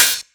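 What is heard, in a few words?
Open hi-hat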